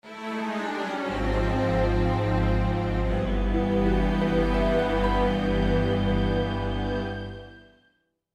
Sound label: Game Over; Ocarina of Time